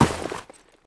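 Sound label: player snow, footsteps; csgom; cstrike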